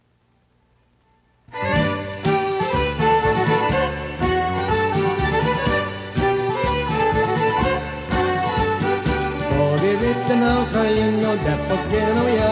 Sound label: Heel Toe Polka